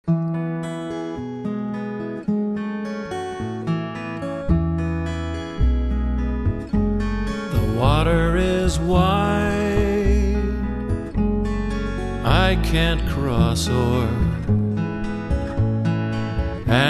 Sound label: Water Wide